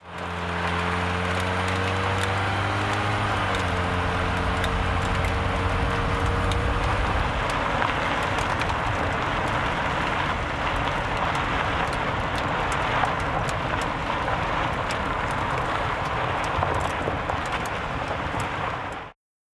Car

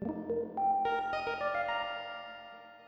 portal exit; 0°N 0°W

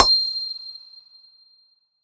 UI Menu Element; Skylanders Cloud Patrol; Arcade, iOS, Mobile

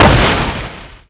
Shoot; HotHead

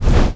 Perk Flying Into Player; subway surfers city sound effects

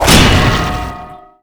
Anvil Rain Impact; MagicItem

